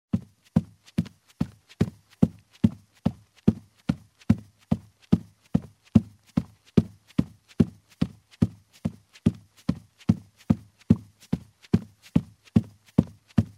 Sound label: Running barefoot on a carpeted wooden floor; steps